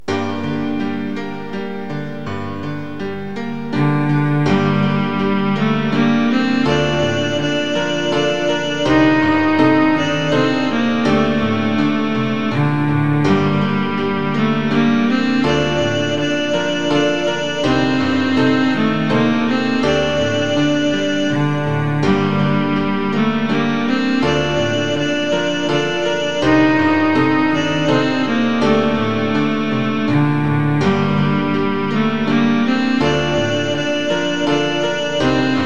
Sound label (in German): violaeinspielung